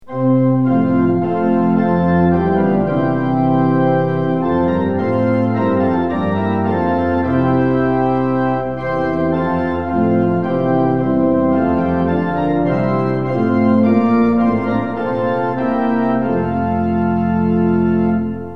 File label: tune; hymns; lillenas